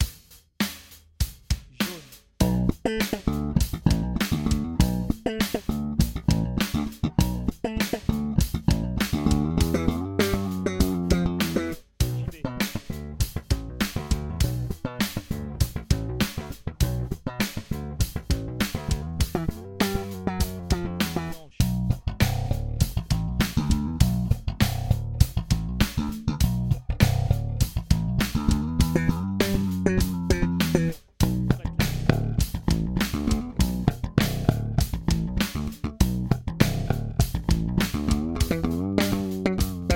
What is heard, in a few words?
slap mid, bass